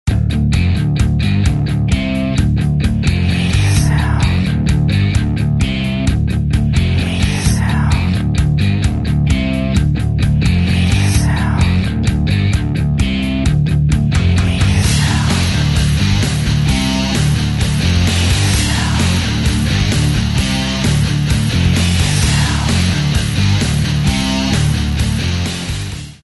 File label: Jinx - 130BPM, Rock